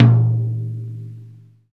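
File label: tom mid, Roland; Club-50 - Foundations Roland; Ext.Snare 2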